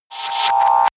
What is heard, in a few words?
rise hum buzz